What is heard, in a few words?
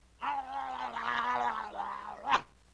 LoFi Synthetic Squawk